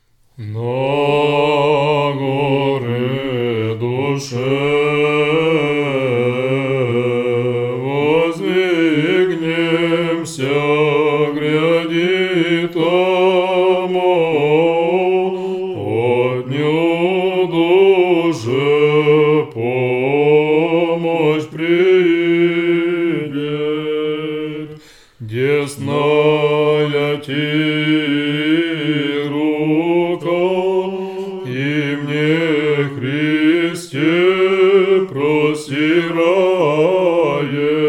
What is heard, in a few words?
second antiphon tone 8